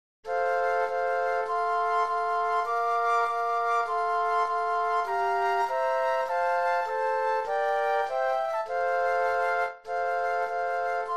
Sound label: Flute Trio; classical